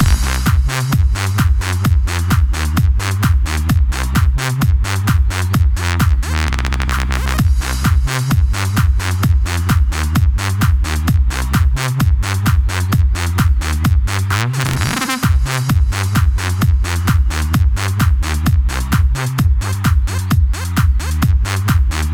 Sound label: Wobble Wobble; PS4; gamerip; electronic super joy 2